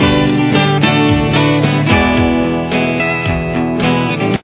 full pre, guitar

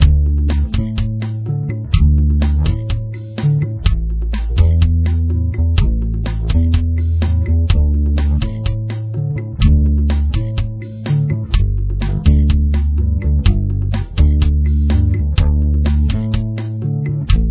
bass

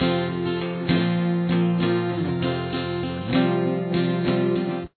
outro

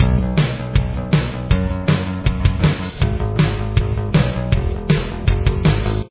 chorus bass

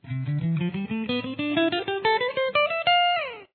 C major 1st position, guitar

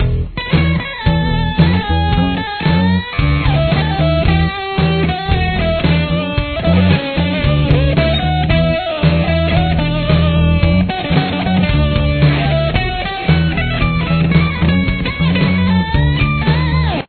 solo

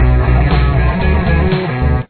pre-chorus main, with the bass; drop D tuning

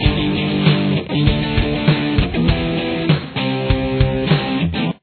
main guitar

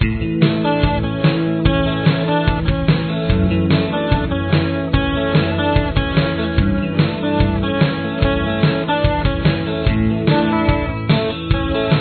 verse guitar

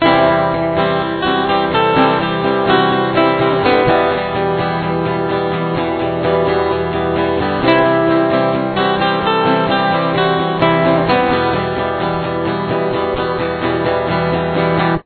fullchorus, guitar

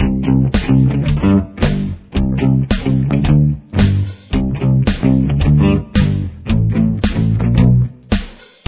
guitar

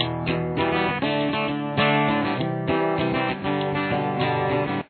pre verse; guitar

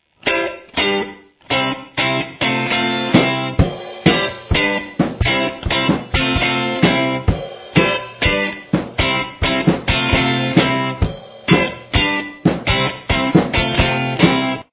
intro guitar

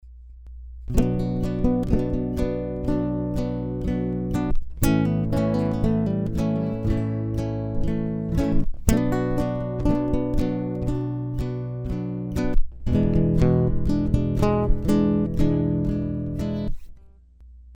E full, fingerstyle guitar basic jams

